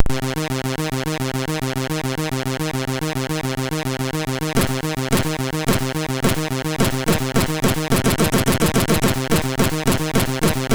Fast Death, MOS6581R3

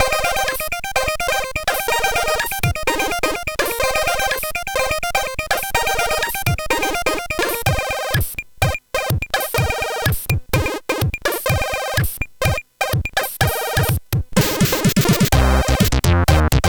Oriental

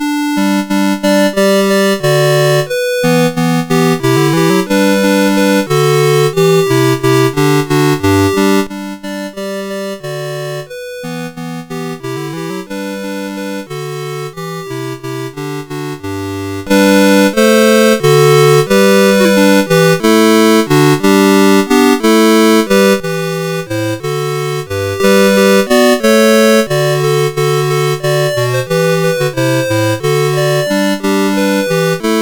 All ye Who Music Love, CSG8580

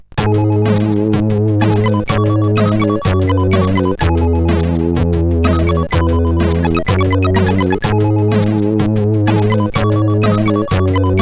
Megahertz tune, CSG8580R5; Megahertz 0 tune 3

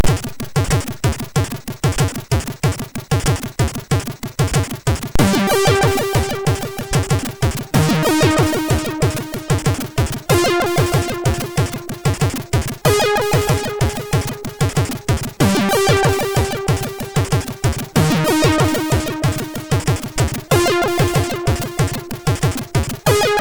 Synthy; hvsc

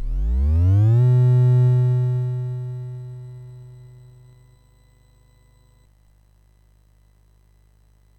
Project Firestart, MOS6581R4

